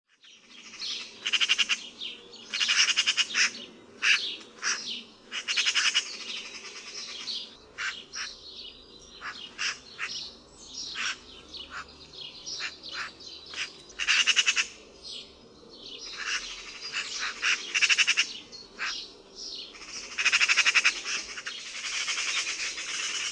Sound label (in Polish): SROKA